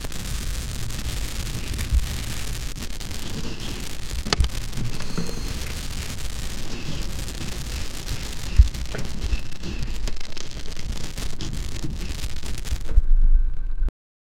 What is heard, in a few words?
heavens ambience